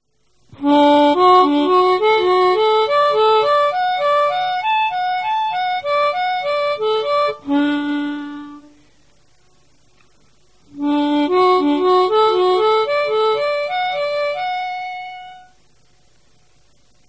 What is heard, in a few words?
sliding, harmonica